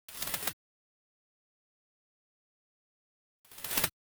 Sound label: In Flight Birds